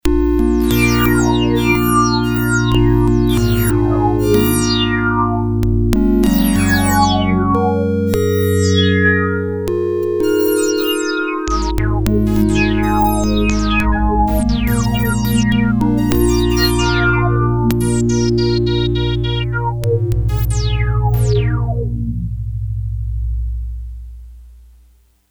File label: Pulse Width Delay, Prophet-5; Sequential Circuits Prophet-5 (Ver.3); Factory Patch; File 3 Programs